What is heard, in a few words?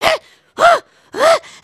panic; emotes